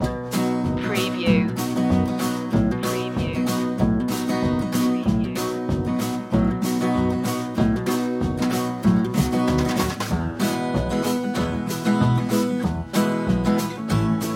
rhinestone rambler loop

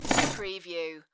Heavy Chain Drop

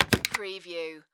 Notary Stamp Push Down

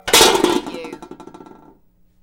Metal Debris Impact Settle

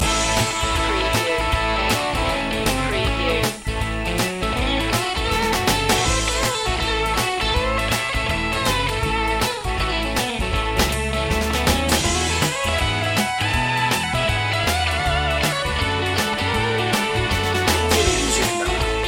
dobro shuffle loop

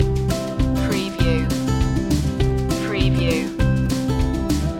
happy tune loop

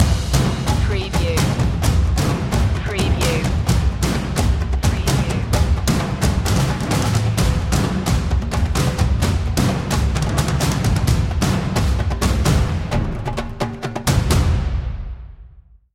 storming percussion

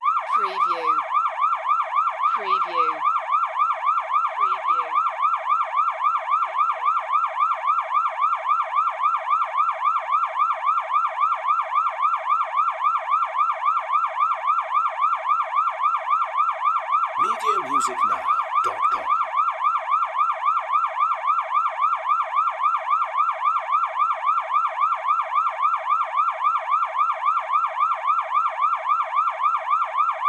Police Siren Steady

